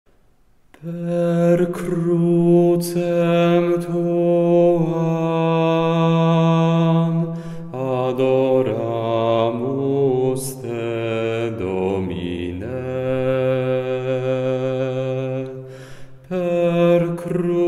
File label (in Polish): bas; Per Crucem tuam; Wielki Czwartek; spiew na triduum